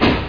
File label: steam; NISSAN; cargo